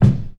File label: hot kick c sharp key